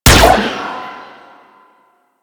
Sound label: Gun Fire; Attacks; Warframe; Hyena